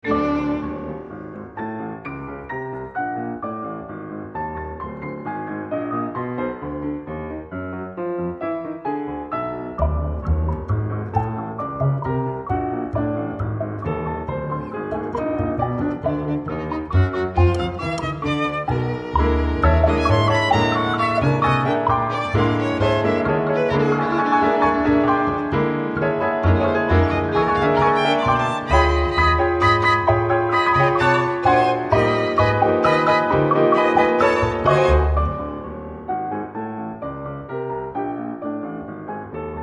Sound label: groove surfing